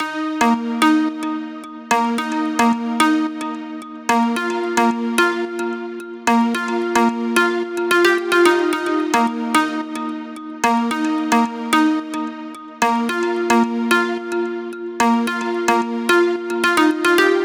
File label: Pluck Lead - G# 110Bpm, House; Ghosthack Advent Calendar; House Kit; House Construction Kit